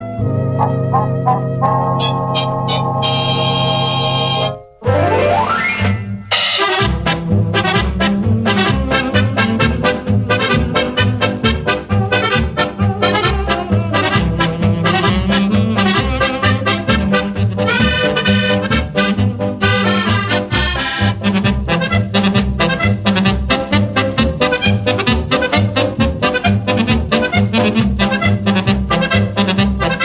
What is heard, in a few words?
Polka, Musette Orchestra